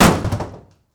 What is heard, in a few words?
wood door kick